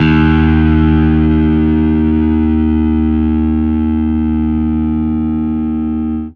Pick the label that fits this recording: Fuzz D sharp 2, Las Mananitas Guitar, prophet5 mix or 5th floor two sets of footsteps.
Fuzz D sharp 2